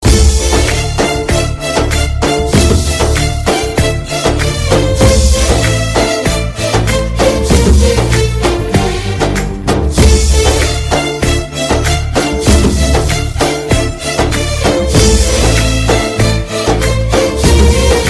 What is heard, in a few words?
music hindi ringtone